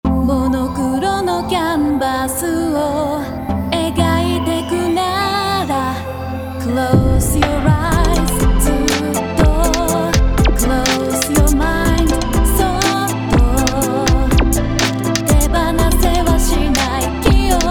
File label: Fill